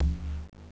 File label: PAD